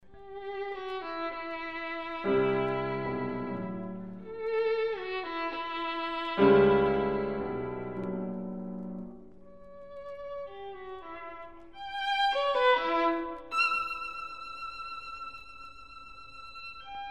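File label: violin sonata; scharwenka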